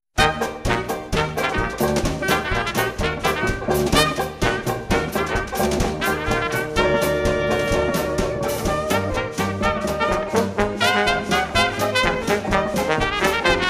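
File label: Oldtime Jazzband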